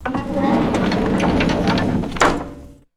heavy old wooden door sliding

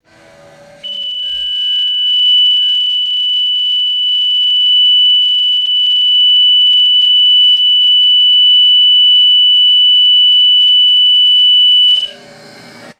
forklift reversing alarm beeps steadily; vehicles

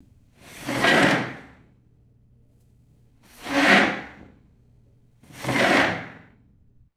sharp chair scrapes on hard floor

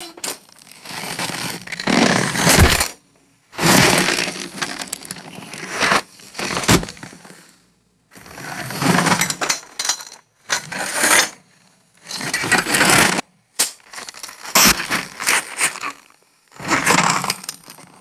dragging plastic and metal debris; destruction

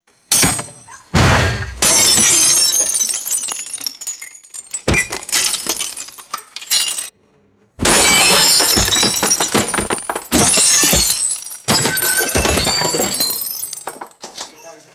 window glass shattering and shards scattering

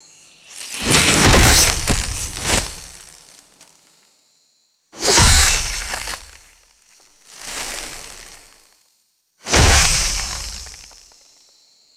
heavy crunchy palm vegetation impacts

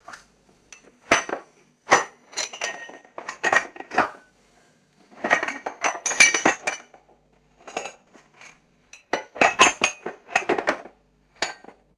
ceramic dishes clanking and rattling

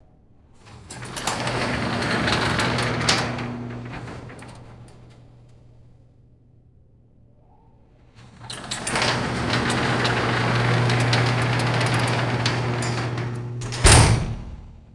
metal garage door opening and closing; doors

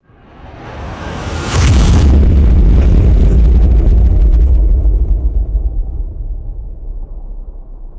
sci fi whoosh to heavy metallic impact; designed

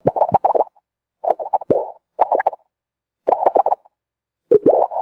resonant wobbly cartoon synth pops

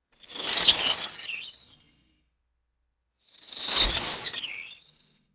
short metallic whizzy cartridge pass bys

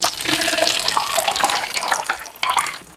heavy water pour into large tub

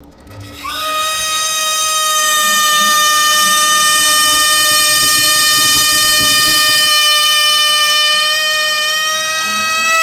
mechanical factory hooter wailing; vehicles

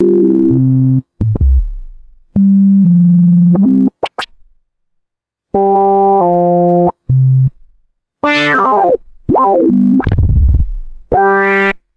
bubbly descending sci fi synth power down

